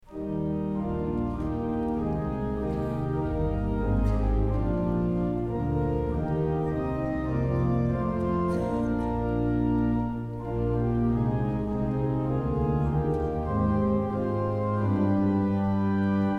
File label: hymn